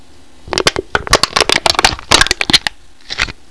CAN CRUSH

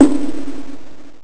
Stone; BoulderDдsh; MarkoSuominen; GraveYard

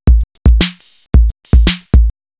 808 LOOP; DRUMS; LOOPS; cubase; CUBASIS

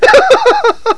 LAUGH

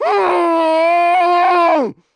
CRAB CRY; KRONDOR

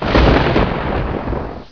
THUND; MCRAFT

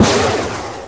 BOOM; CYBERSPD

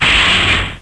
BASH IT; THEXDER

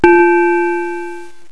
BELL NOTE

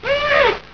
HRMM

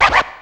SCRATCH; BEAT2000

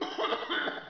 COUGH

